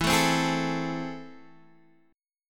A Minor Major 7th, E

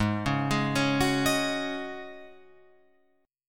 G sharp 7th Suspended 4th G sharp, Guitar Open F; G#7sus4 arpeggiated; JGuitar

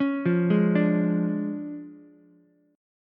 D Minor Major 7th Flat 5th Ukulele, chord; DmM7b5; arpeggiated; JGuitar

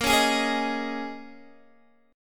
Bb Minor 11th, Guitar, Open D

